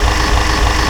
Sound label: MACHINES; ASSORTED MACH; FX